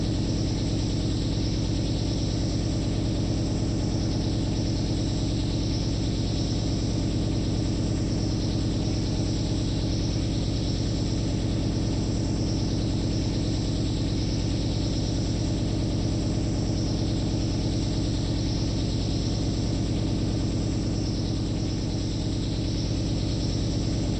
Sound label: sci fi space engine mechanical; CRAZY SCI FI AUDIO